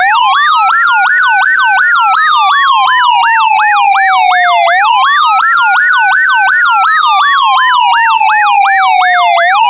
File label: WAIL1 YELP1, LED warning bar; UltraLITE Plus Exterior LED Warning Bar